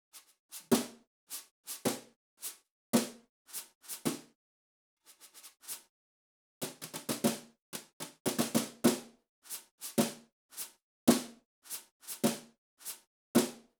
brush 12 snare swish and close; Brushes on 12 Inch Snare; professionally recorded acoustic drums

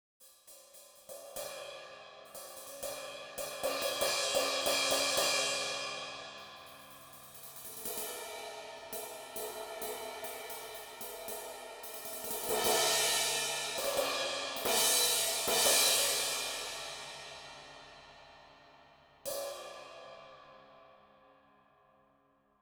brush crash; Brushes on 15 and 19 Inch Crashes; professionally recorded acoustic drums

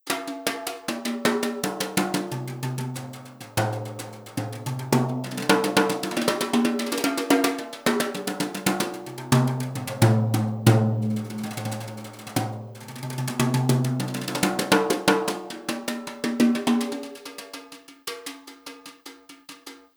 brushes bop toms; professionally recorded acoustic drums

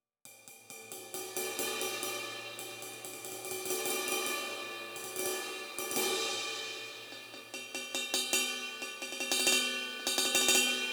ride 19 sizzle and bell; 19 Inch Sizzle Ride & Bell; professionally recorded acoustic drums

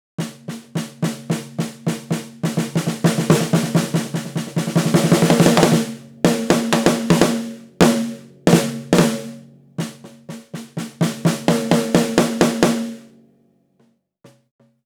snare 14 rock; 14 Inch Rock Snare; professionally recorded acoustic drums

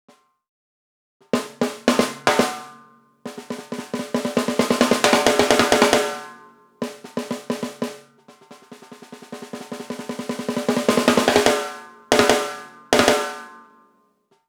snare 14 metal; 14 Inch Metal Snare; professionally recorded acoustic drums

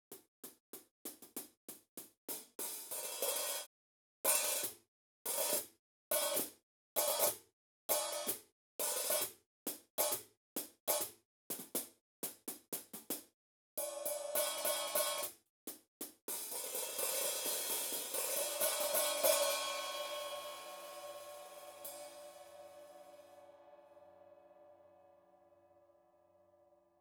brush 13 inch hh, drum samples; Brushes on 13 Inch Hi Hats; professionally recorded acoustic drums

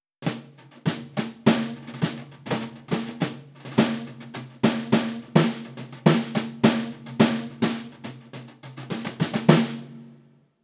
brushes rock snare; professionally recorded acoustic drums

